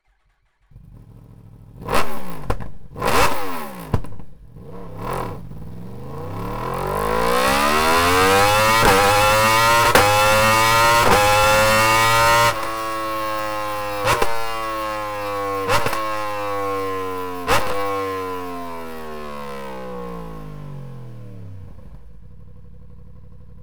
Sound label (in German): Slip-On Zwischenrohr